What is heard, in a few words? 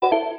steam